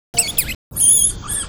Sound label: loud w, degu